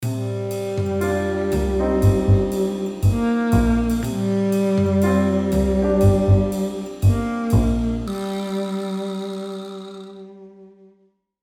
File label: Mystery situation light suspense scene sitcom